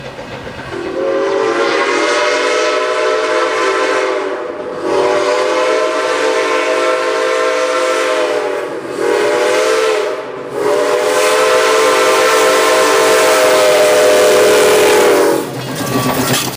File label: Chime